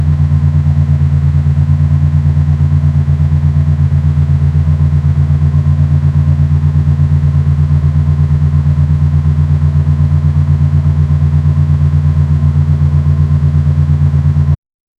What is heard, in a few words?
Toyota Land Cruiser, truck; 1990s